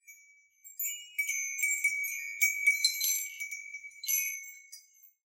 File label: Wind Chimes E